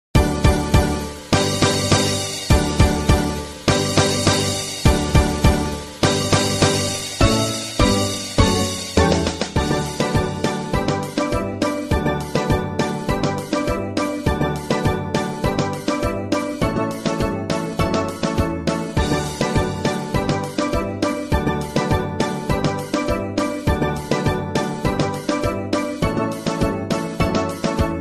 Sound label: This Is A Steel Drum